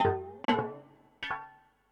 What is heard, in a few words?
ethno 125